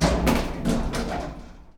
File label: elevator End; casualtiesunknown